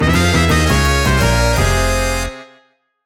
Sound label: lose; donkey kong country 2 fan remastered